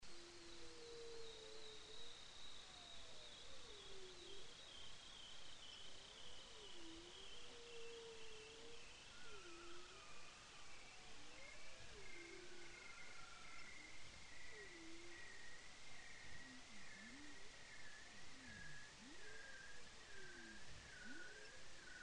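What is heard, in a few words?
bearded seal, Bering Strait; Sounds of the Arctic Ocean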